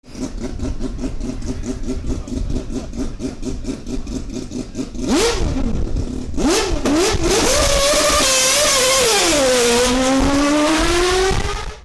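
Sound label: 787b, Mazda 787B Sounds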